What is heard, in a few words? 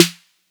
Straight 808 Snare; Drums; Snares